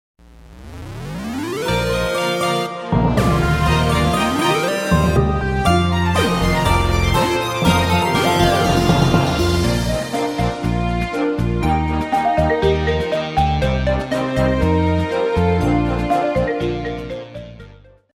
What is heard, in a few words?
Instrumental